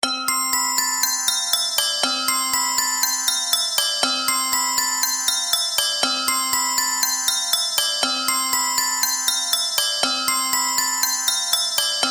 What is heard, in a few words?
Christmas Bells, Joyful ding dong Christmas bells musical loop.; Miscellaneous Musical